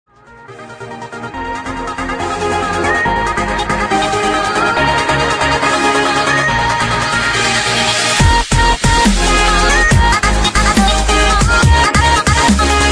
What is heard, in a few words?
dubstep